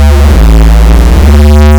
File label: fart